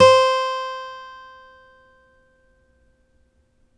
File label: STEEL P C4, Synclavier, GUITARS; Synclavier Sampler Library